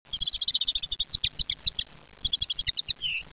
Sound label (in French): linotte